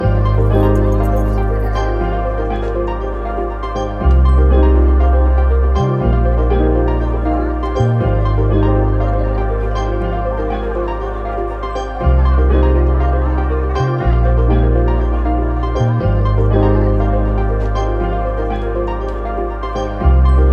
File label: Bona Fide Euphoria Ambient Version